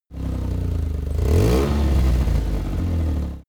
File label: car buggy rev up